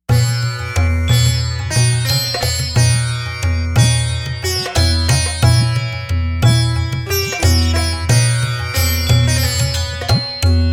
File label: Hava Nagila SITAR